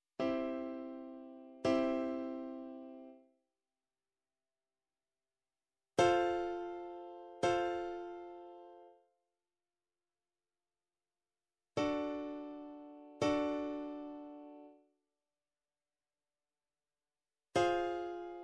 Major minor

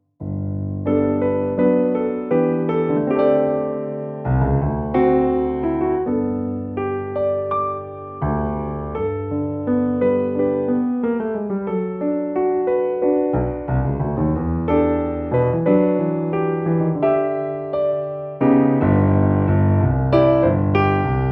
tango; grade 6

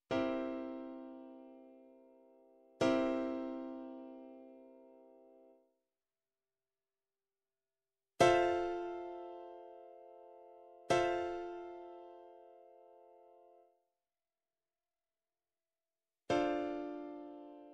Chords dom7 M7 m7 M7 m7 dom7 dom7 M7; Grade 6

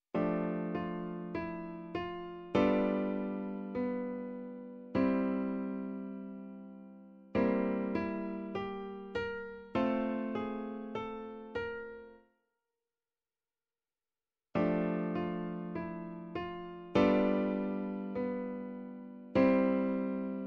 Recognise 1 Bar Modulation; Grade 4

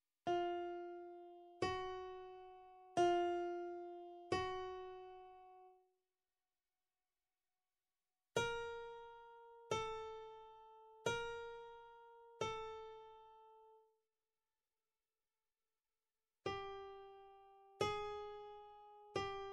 Raised Lowered 1 Semitone; Grade 1

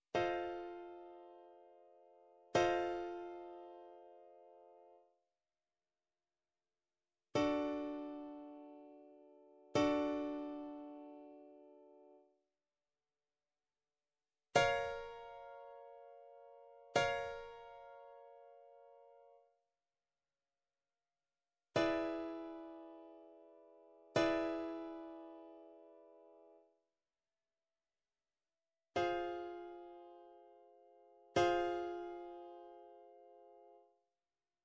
Recognise Chords 7th Mm7th mM7th; Grade 3

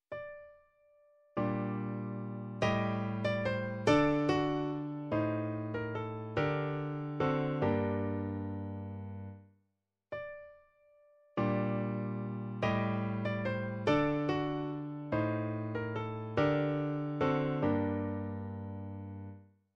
Cadence major minor minor perfect; Grade 8

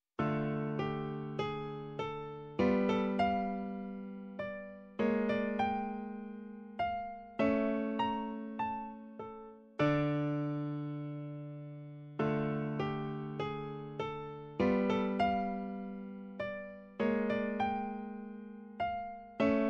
Minor Key; Grade 6

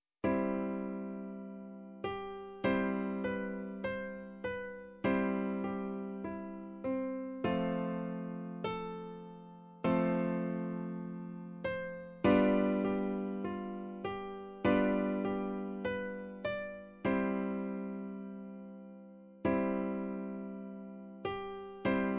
Recognise Chord Progression; Grade 6

